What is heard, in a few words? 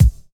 classic good hip hop steel kick drum b key, Royality free kick single hit tuned to the B note